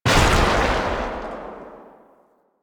Shot, Rifle; Weapons; Warframe; Thanotech; Trumna Prime

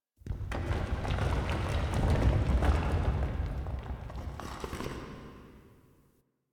Cave Crumble; Ambience; Dungeon Island; Duviri; Warframe

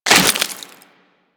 Melee Impact Wood; Warframe; Weapons

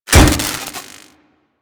Electro Prod Hit; Warframe; Weapons

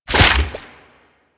Tree Seed Explosion; Ambience; Warframe; Infested Eris

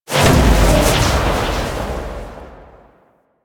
Void Dragon Orb Cast; Enemies; Abilities; Warframe; Duviri

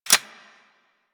M16 Mech End, rifle; Wraith

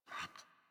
Puppy Idle Pant Short; Enemies; Vocals; Kubrow; Warframe

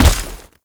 Heavy Step Dirt, Footsteps; Warframe; Tau Dax

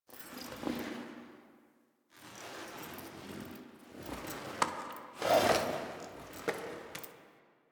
Data Pad Foley; Cinematics; Warframe; The New War; Zariman

